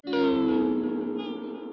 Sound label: Casse Feedback Music